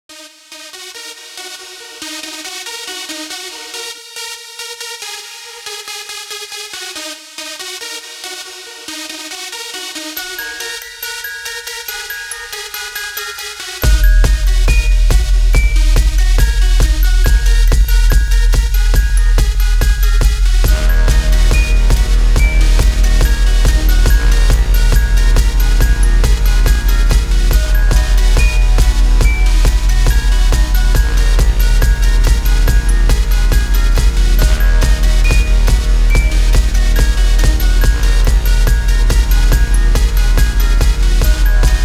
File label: mystery hip hop